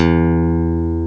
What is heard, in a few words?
E1, GUITAR; AKAI S-Series CD-ROM Sound Library VOL-8